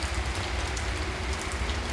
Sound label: Wind Rain